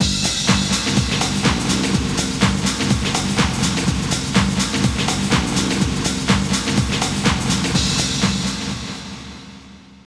Str G#

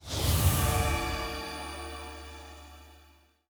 Teleport

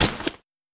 Orc Clothes

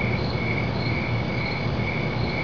CRICKETS; Gothos

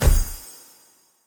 Hit Morale; Hit Morale3

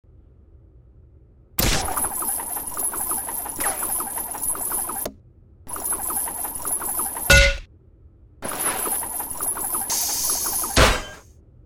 Long shot

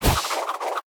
Hive Knight Jump

